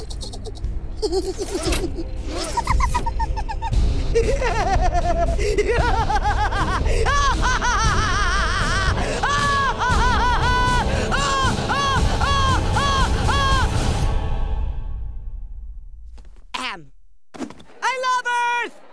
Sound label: laugh